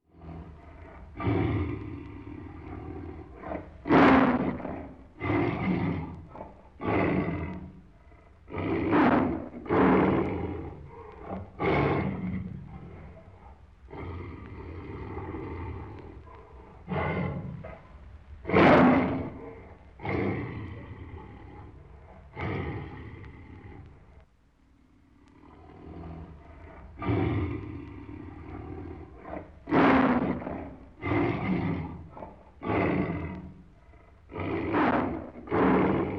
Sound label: Angry feline in zoo cage